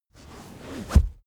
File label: car armrest backseat close